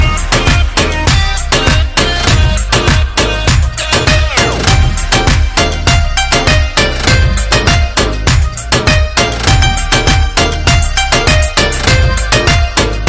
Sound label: Instrumental Tone